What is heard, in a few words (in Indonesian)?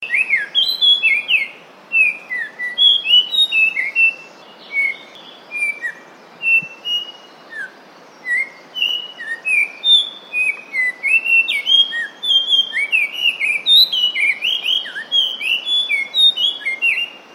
kicauan cingcoang biru